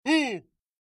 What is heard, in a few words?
LARGE Object Place; super stacker 2 online